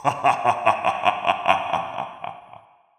evil chuckle; soundscrate

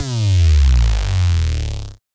Bass Drop; SoundsCrate

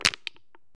soda can twist; soundscrate

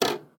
VHS Handling; SoundsCrate

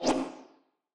anime whoosh

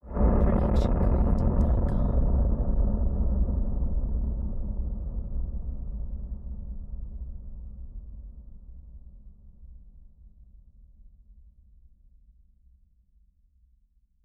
Distorted Low Rumble Hit; SoundsCrate

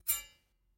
sword swipe; soundscrate

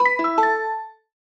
click fx tonal; soundscrate

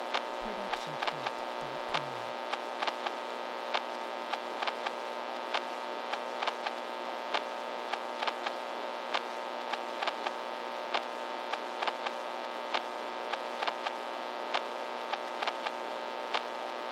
vinyl scratch wear; soundscrate

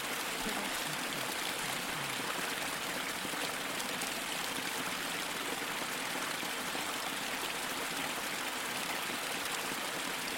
loopable stream medium; soundscrate

